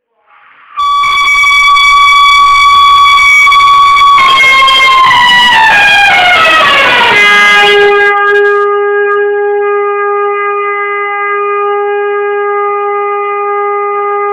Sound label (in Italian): grande tromba venerdì santo